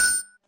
ui confirm; Sfx; freepixel; Tilter

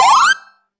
powerup; Items & Pickups

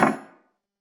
creaking floor; Horror

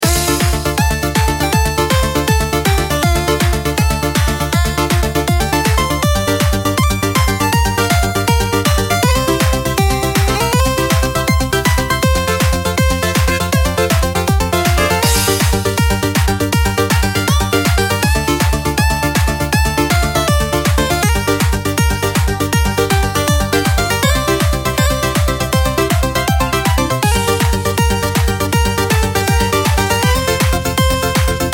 celebration party; freepixel; Emotional

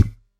wood chop; Crafting

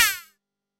arrow trap; Traps & Hazards; freepixel

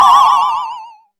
ghost moan; Creatures

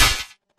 mining pickaxe; Crafting

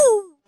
hurt female; Character Voices

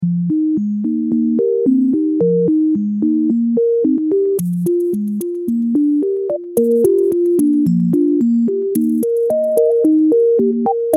ocean depths, music; freepixel; Biomes

